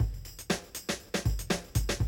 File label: Classic Drum Loops